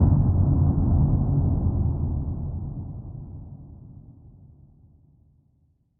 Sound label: low drum hit; low drum hit used during Beast Clergyman phase 1 loop; elden ring; fromsoftware